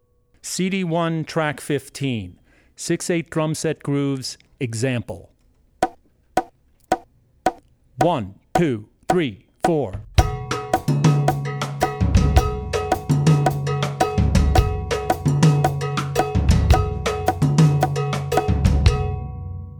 6/8 Drumset Grooves; Drummer's Bible Tracks

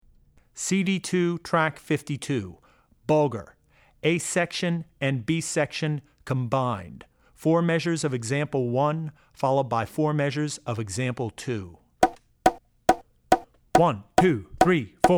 Klezmer - Bulgar; Drummer's Bible Tracks

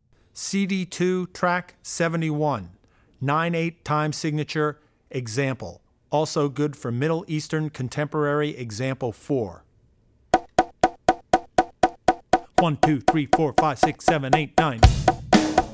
Odd Time - 9/8 (Broken Up); CD2 Track71 Odd Time 9-8 (broken up); Drummer's Bible Tracks

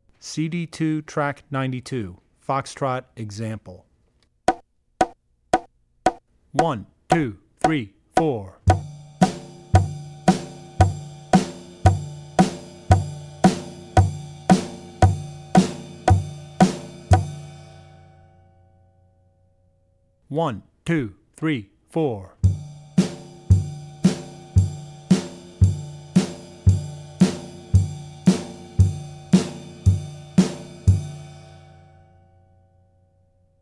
Wedding Dances Fox Trot; Drummer's Bible Tracks

